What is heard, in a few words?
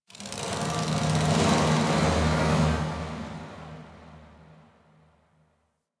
Ship Metal Movement Texture Large, Ambience; Warframe; Corpus Ship Remaster